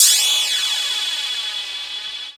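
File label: 125 drum 2 R, Fresh Disco House; USB Soundscan vol.20; AKAI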